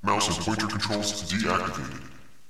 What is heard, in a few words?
mouse deactivated; spacegame